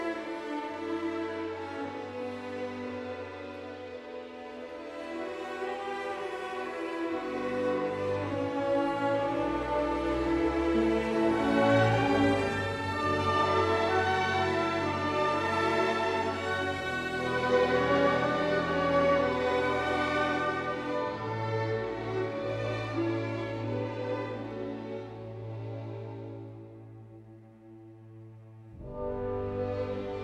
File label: Turandot Nessun Dorma Andante Sostenuto no Vocals Karaoke Version, Symphony